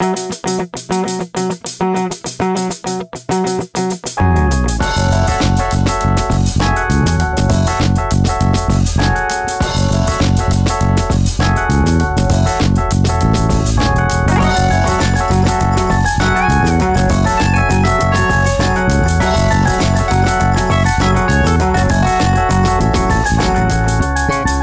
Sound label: 70s Inspired Funk